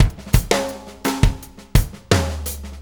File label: Drum Tools, Loops